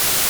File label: private message; pilotclient